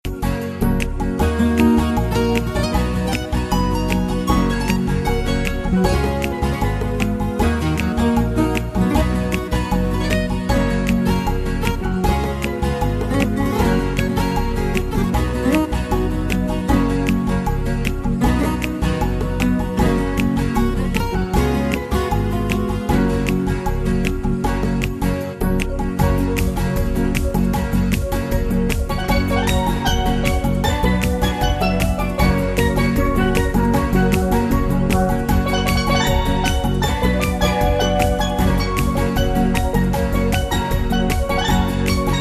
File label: Dance Bossa Nova